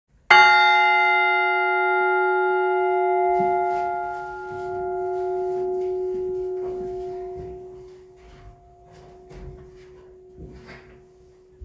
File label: horsham, bells